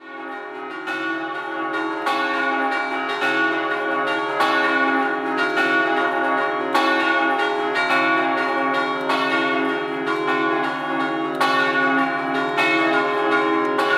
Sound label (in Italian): OSOPPO, campane